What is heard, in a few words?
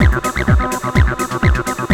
ACID JAM